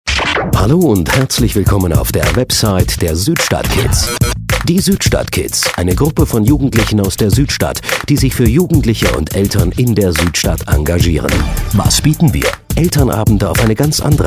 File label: jingle